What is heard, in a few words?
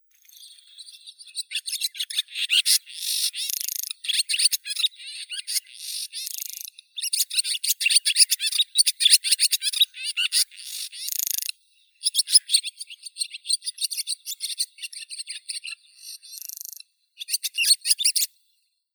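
barn swallow, Hirundo rustica; lastochka derevenskaya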